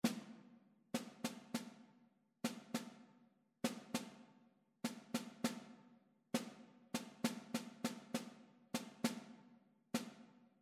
rhythmic error detection

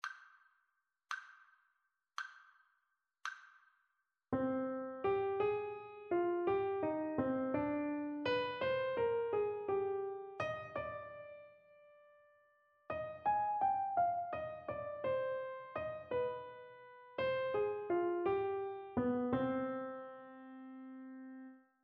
partially completed melodic dictation

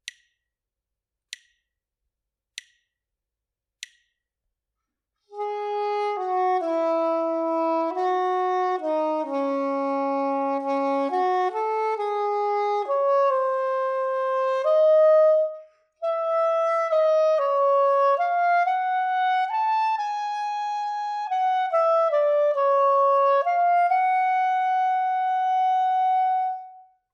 melodic dictation; ear training

